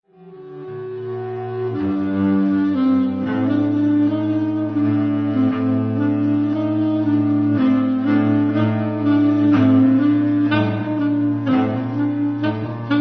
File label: cello